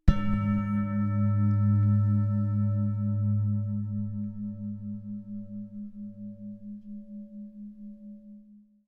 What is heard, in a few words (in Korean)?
싱잉볼 사운드